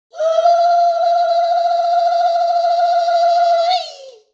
Moroccan call

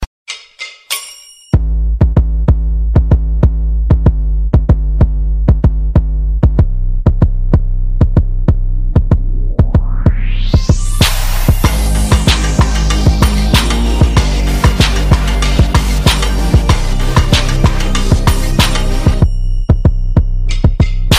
Xtreme Bass Sms

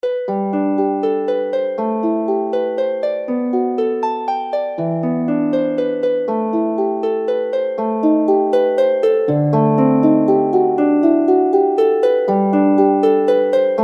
House

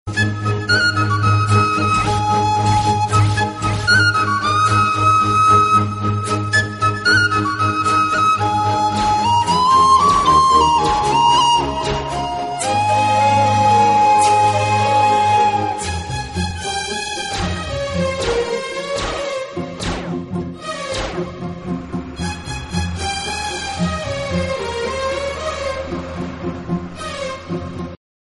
Music

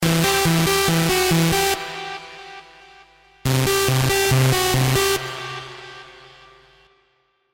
Trance message

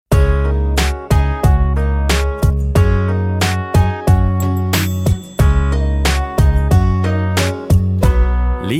Romantic Heart

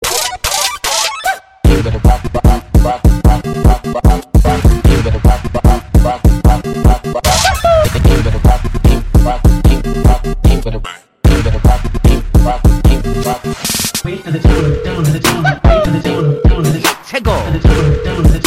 Techno

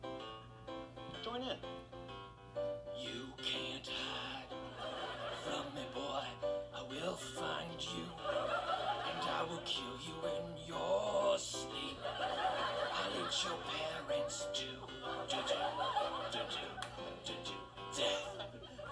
Haunted Piano

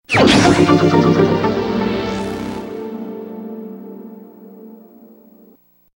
Game Item

